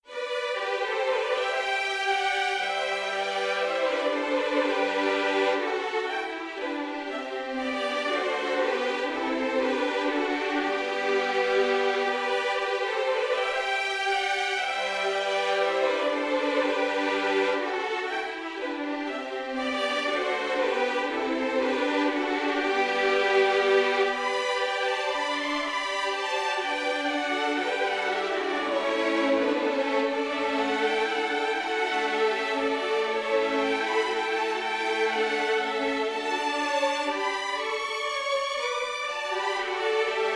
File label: Symphonic Strings; Spitfire Audio; BML Reihe